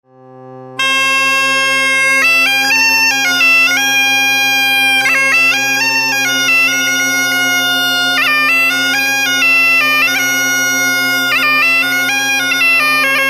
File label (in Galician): gaita galega